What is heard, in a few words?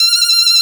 SONA F5